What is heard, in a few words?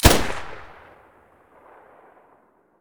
muzzle vector stereo, weapons